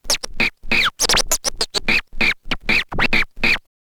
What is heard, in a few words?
scratch short, Vinyl scratches